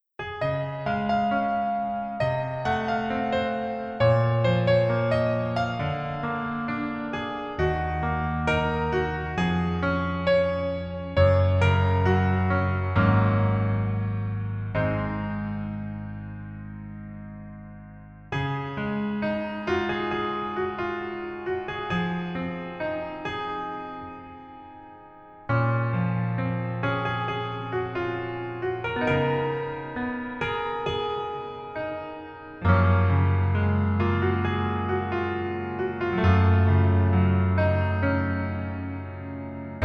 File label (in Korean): Piano ver 멜로디MR 남자키; 그 남자의 기억법